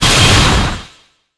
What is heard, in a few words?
Ice Comet Target